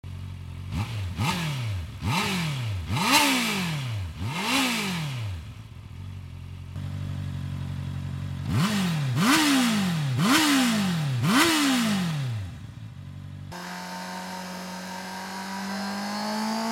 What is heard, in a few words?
CBR650R sound, motorcycles, supersports; cbr650r 2019